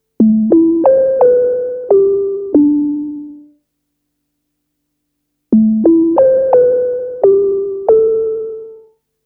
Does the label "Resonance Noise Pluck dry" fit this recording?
Yes